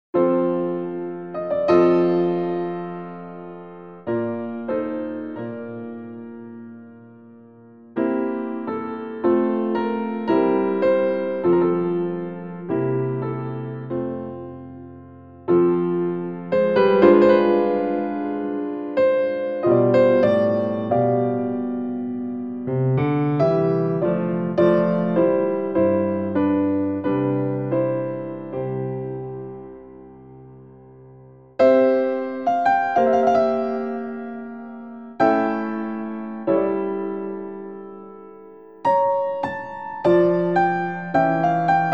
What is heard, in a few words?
Piano; EWQL silver Piano